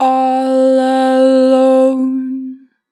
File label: Natural Vibrato, Vocals, All Alone, 2 bar vib; Harmor Vocals